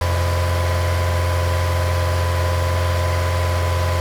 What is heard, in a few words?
engine; Formula Racing